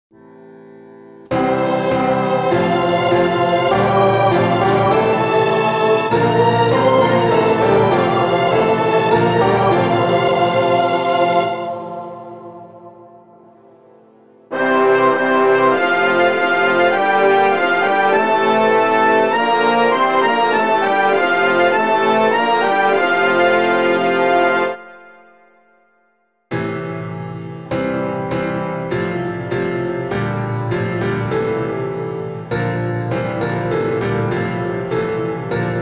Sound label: keyb; … und vergib uns unsere Schuld (Matth. 6, 12)